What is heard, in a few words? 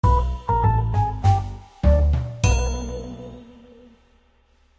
lose; 3d match